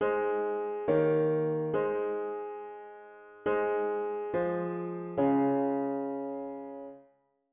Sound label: diat root w bass